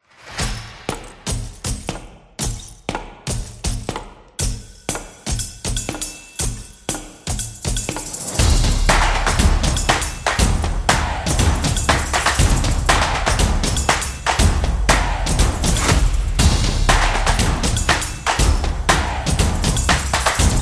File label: Ingredient, soccer drums